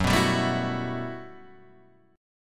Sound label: G Major 13th